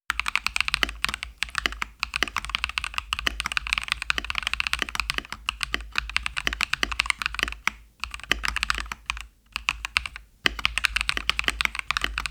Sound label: dell clavier alienware pro soundtest